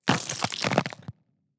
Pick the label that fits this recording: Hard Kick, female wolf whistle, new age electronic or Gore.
Gore